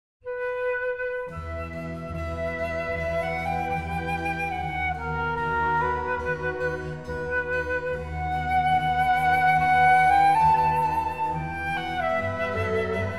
Flute Concerto In G; Piacentino

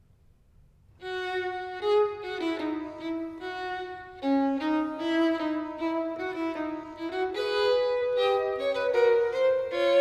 Violin Sonata No. 3 in C Major Fuga; BWV 1005; Bach

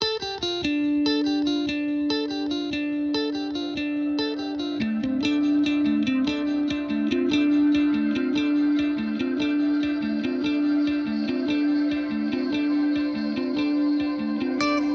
Fractal Guitar

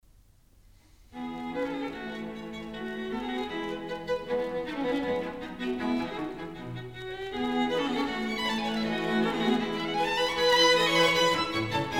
Quartet in E, String Quartet; Haydn; Op. 54

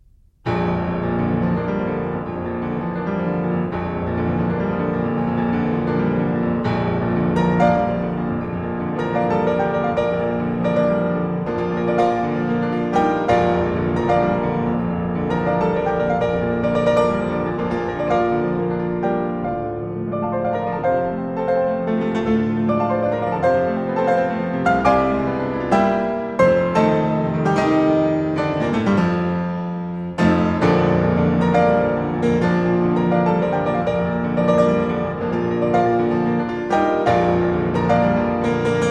Prelude in B flat major, piano; 24 Preludes

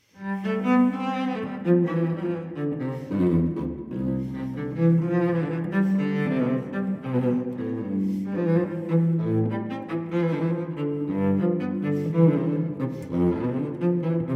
Cello Suite No. 3 in C major Allemande; BWV1009; Johann Sebastian Bach

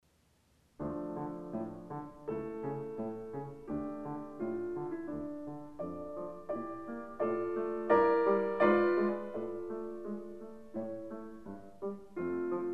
Piano Sonata No. 15 in D major; Wilhelm Kempff; Beethoven; Piano Sonatas

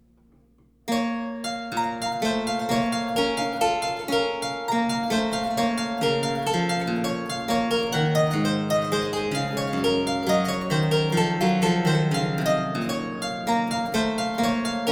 Menuet Trio Menuet; French Suite No. 3 in B Minor, BWV814; Johann Sebastian Bach

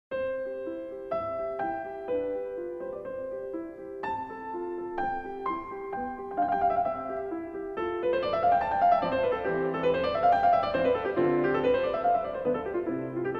Piano Sonata in C - Allegro; Wolfgang Amadeus Mozart; Piano Concertos Nos 20 & 24

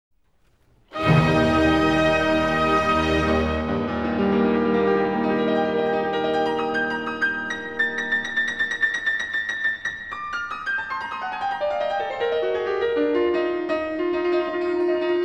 Piano Concerto No 5 In E Flat Major, Allegro; Beethoven; Op 73; Emperor

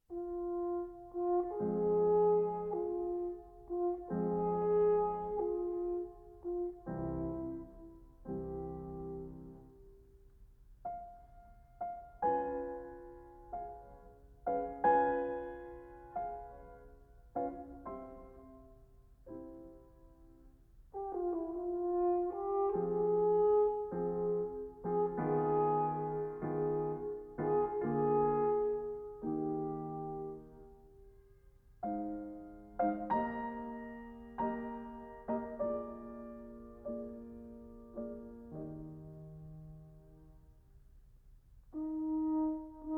Sonata for Horn and Piano in F Major, Poco adagio

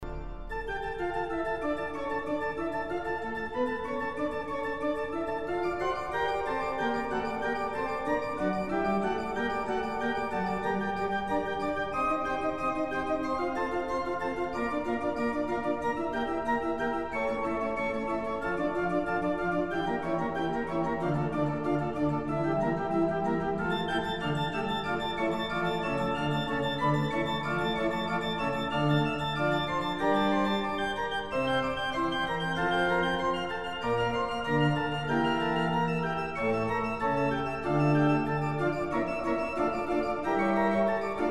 Toccata and Fugue in D minor; Bach; BWV 565

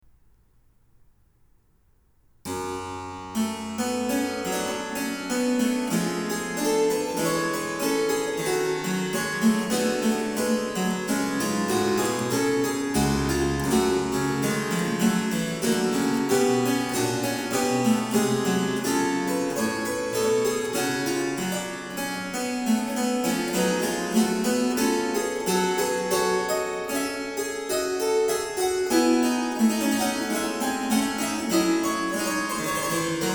Harpsichord; Variatio 21. Canone alla Settima. a 1 Clav; Bach; Goldberg Variations; J S Bach; BWV 988

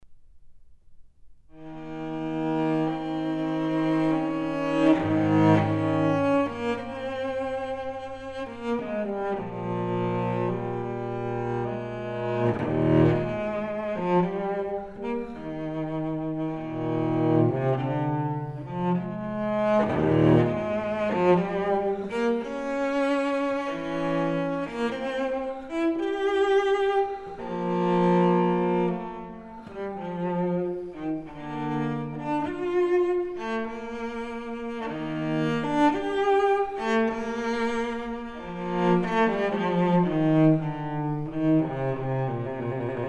Suite for solo cello No. 4 in E; Bach; Cello Suites

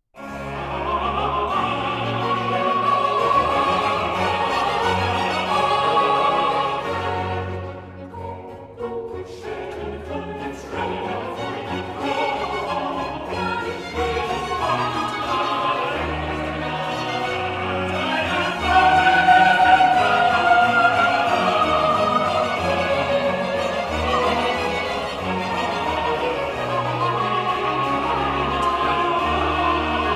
Amen, Cantata; Nun komm, der Heiden Heiland; BWV 61 - 6; Bach; J S Bach; Advent Cantatas

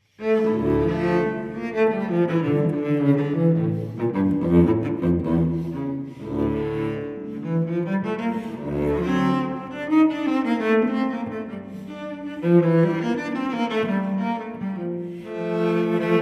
Cello Suite No. 2 in D minor Allemande; BWV1008; Johann Sebastian Bach

